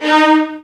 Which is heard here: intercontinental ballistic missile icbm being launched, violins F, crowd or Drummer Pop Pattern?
violins F